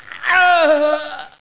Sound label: scream, scientist